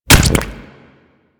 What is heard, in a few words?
Goo Gun Sack Land Large